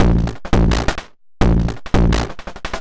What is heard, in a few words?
Beat Octofuzz 170, distorted drum loops 170bpm; musicradar